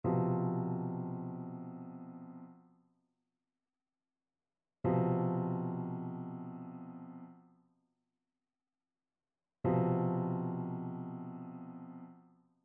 trichord; trichord identification

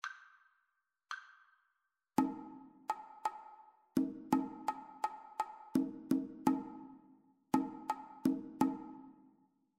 two part rhythmic dictation; ear training

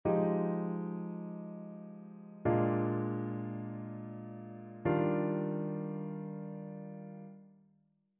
ii V I progressions; ear training

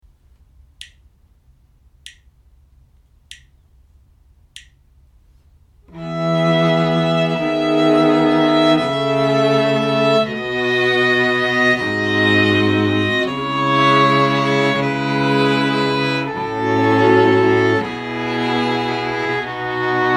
harmonic error detection; eartraining

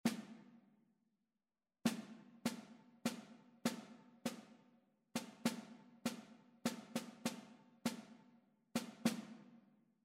rhythmic error detection; ear training

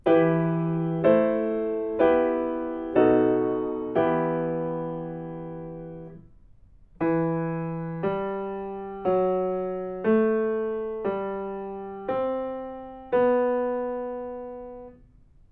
melodic fragments; ear training

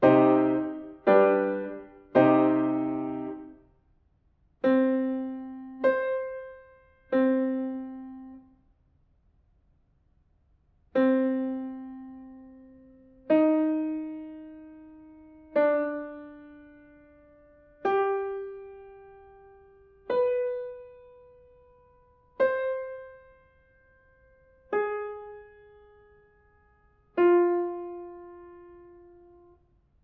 diatonic collections

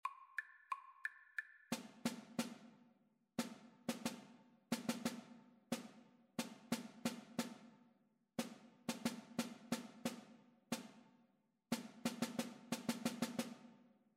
rhythmic dictation; ear training

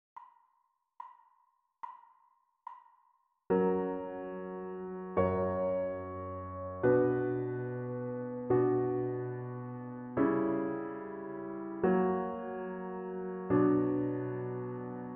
harmonic dictation; ear training

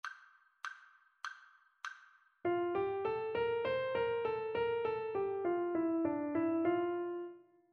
melodic dictation; eartraining

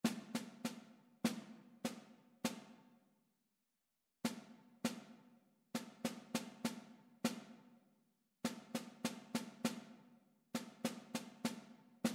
rhythmic error detection; ear training

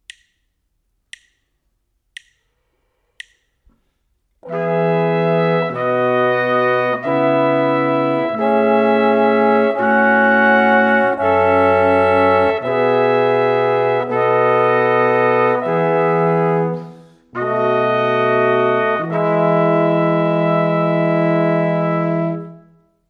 harmonic error detection

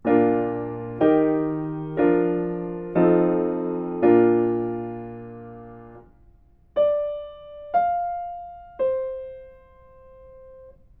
melodic fragments

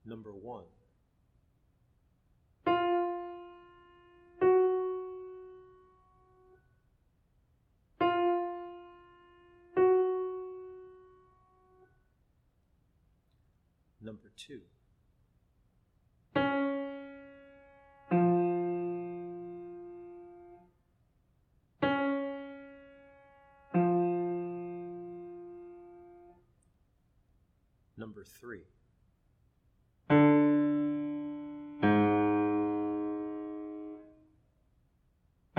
intervals

